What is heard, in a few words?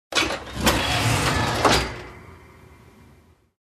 Outboard motor turned on